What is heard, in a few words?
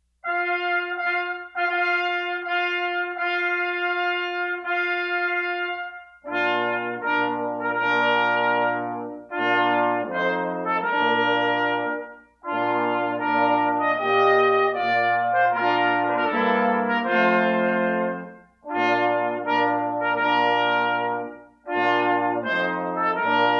Bridal Chorus brass